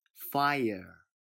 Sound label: fire